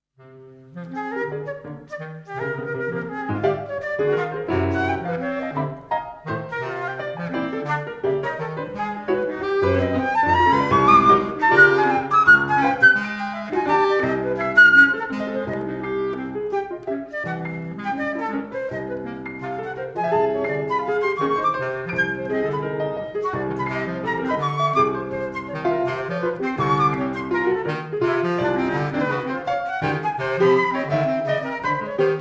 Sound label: Red Jazz